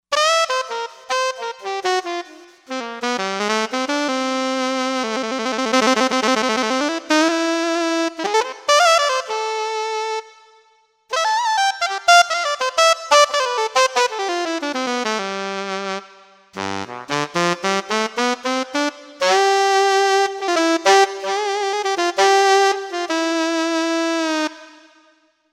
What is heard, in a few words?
Alto Sax